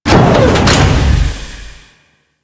Fortress Platform Stop; Ambience; Warframe; Grineer Fortress

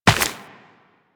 Run Dirt, Footsteps; Warframe; Tenno

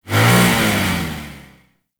Infested Hammer Rev, Weapons

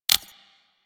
Console Hack Button Press; Warframe

